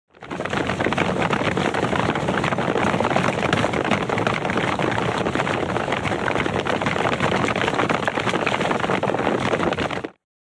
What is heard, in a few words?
Group Stampede Steps